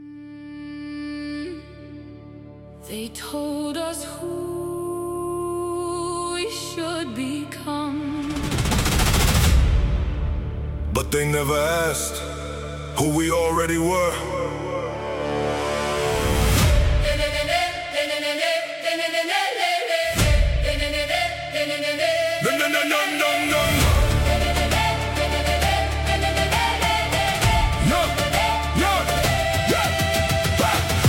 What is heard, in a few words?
epic viking rap viking song viking duet